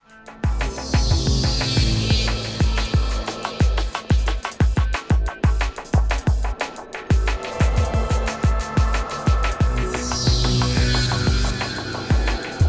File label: bathroom entertain